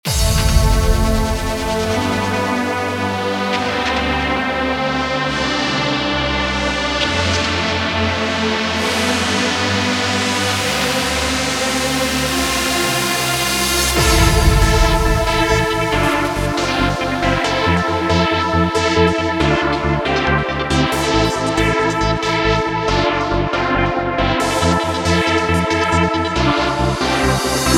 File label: JUP 8000 v; Arturia; Trance Essentials Soundbank Vol 3